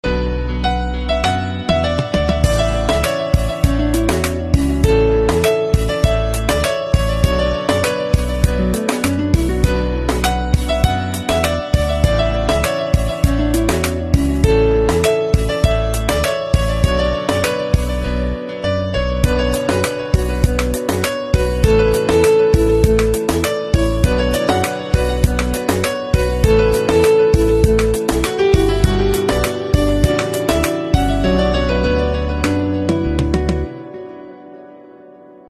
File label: Xylophone Instrumental Ringtone